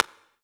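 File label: Snare Stick; drum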